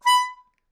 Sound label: Melodica Short; Short Notes Chromatic